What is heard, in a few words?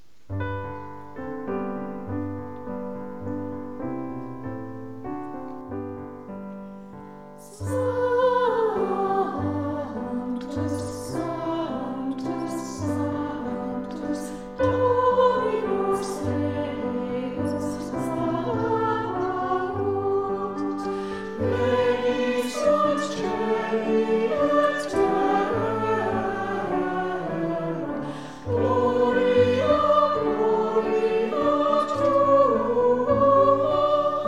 sanctus choir and piano; Heavenfield mass